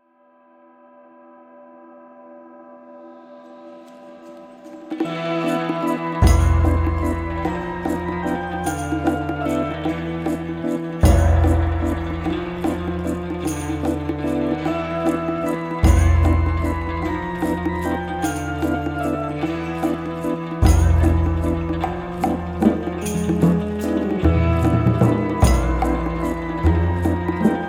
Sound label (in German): Orgel; Musik für Kinder Hörspiel